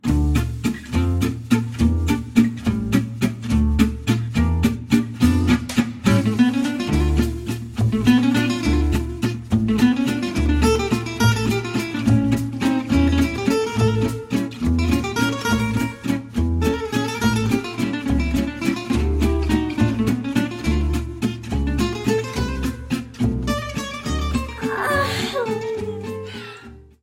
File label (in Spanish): Guitara Espanol